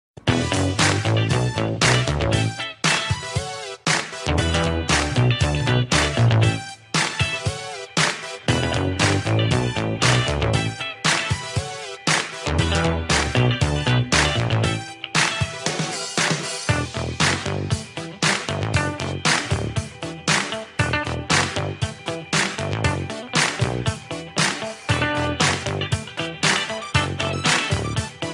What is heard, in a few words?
Funky Bassline